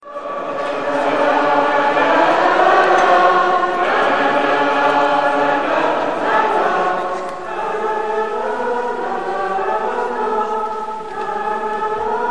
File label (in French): generique